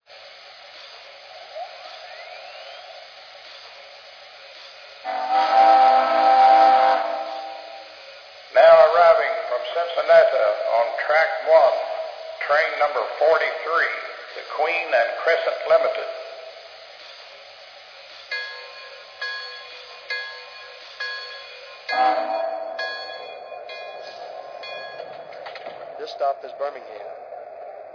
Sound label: ps4